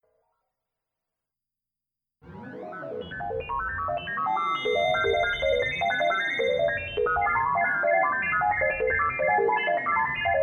computer noise

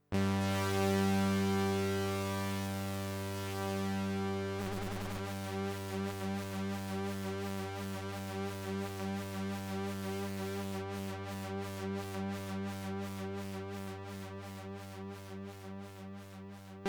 G drone

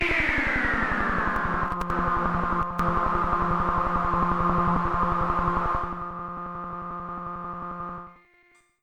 F pulse 120

